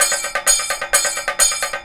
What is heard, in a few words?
KATAKA, Indian Traditions; USB Soundscan vol.45